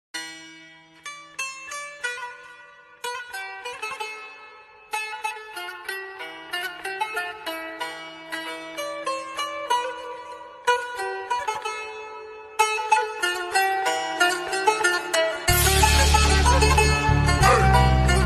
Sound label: Saz Turkish Very Sad